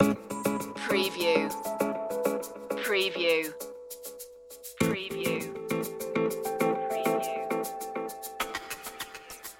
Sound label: bossa technova loop